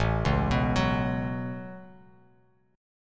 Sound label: A 6th Add 9th A down Bass Standard; A6add9; arpeggiated